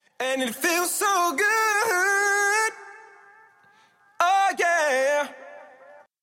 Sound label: Vocals